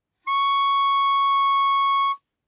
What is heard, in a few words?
Bb Clarinet ff Db6 stereo; Woodwinds